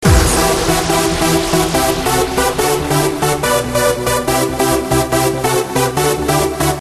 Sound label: Vintage Beat